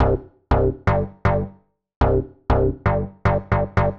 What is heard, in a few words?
Digi Bass (120BPM), retro house